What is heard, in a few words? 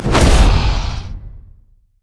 dragons descent; LoL; shyvana